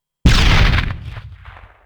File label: small explosion, light bomb; explosions